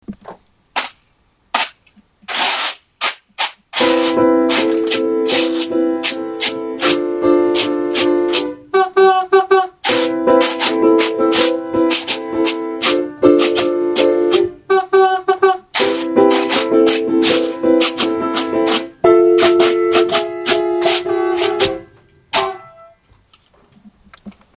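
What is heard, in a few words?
guiro guitar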